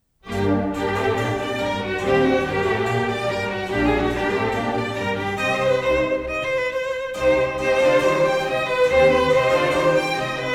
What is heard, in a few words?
Concerto in G minor, Violin Concertos; BWV 1056; Johann Sebastian Bach